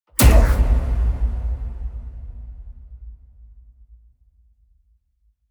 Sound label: Impacts DESIGNED; SoundBits; Just Impacts